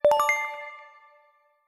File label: notice